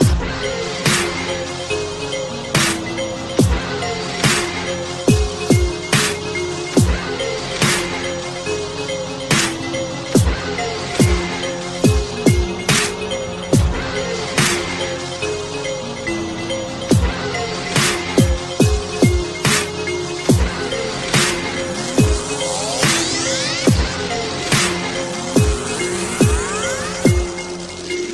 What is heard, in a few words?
Dubstep